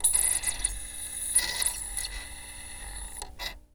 Electronic CD Load In CD Player

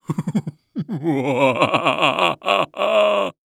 Cartoon Human Male Laugh Evil Vampire Laugh

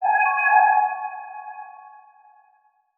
Transition Musical Tone Warble; Production Element; Title Transition; AdobeCC

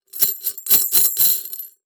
Foley Metal Rings Glass Bowl Rattles Short

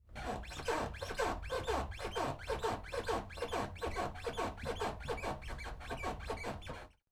Household Bed Squeaking Bed In Next Room

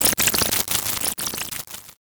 Transition Digital Distortion; Production Element Title Transition

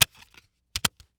Technology Electronic CD Case Put Cd In Case

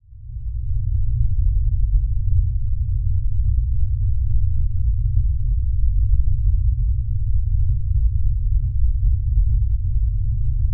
Drone Science Fiction Heavy Rumble Dark; Production Element Imaging; AdobeCC

